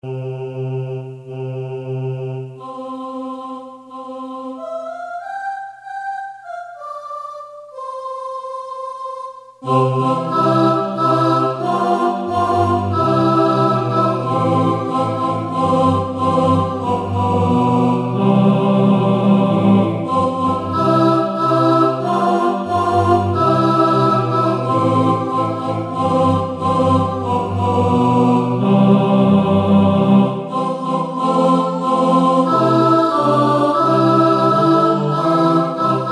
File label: ALTO